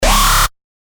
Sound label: WAAUGH